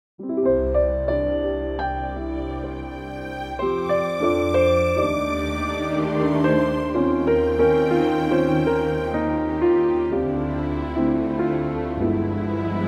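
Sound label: orchestra C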